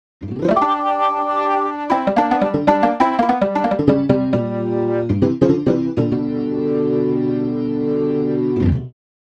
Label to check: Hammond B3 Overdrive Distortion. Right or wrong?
Right